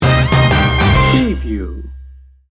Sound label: chips and salsa sting bumper